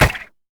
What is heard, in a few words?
sad mummy crit attack; LoL; amumu